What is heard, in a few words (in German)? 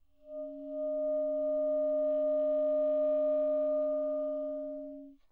unterblasen, multiphonic